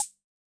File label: Conga Hi; drum